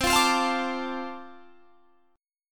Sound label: D-Minor 7th C, chord